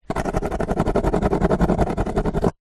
office pen ball point scribble scrub out empty cardboard box